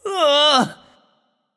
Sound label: "ugh"; smite; voicepack; janus